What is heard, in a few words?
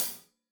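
hat closed, hi-hat cymbals stereo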